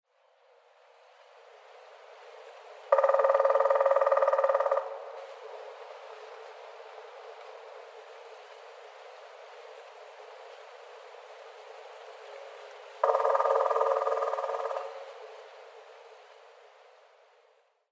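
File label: Grey-headed Woodpecker, muroran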